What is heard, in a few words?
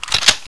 oicw clip in